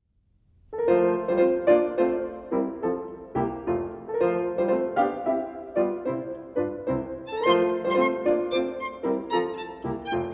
Violin Sonata in F major 'Spring' Op.24 - 3. Scherzo (Allegro mol; LUDWIG VAN BEETHOVEN